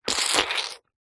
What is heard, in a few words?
cartoon squelch squish mouth saliva